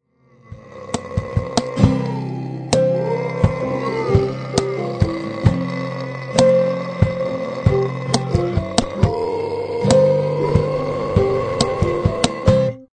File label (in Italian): assolo